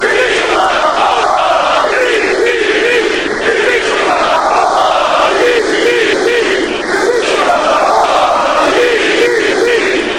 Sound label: chant, fanchants; levski